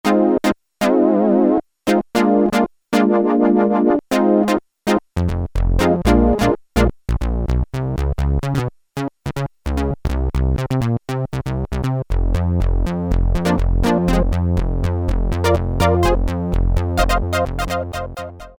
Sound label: Plucked Synthe, Korg Poly-61M